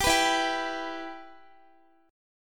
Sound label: E Minor E down Guitar; strummed